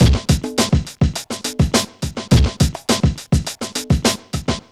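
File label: 104 bpm, Funky loops; Zero-G; Funky Elements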